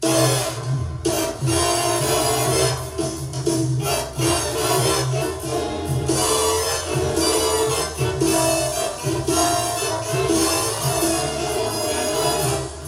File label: instant heat half speed no solos